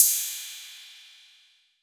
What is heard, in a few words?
PERC 808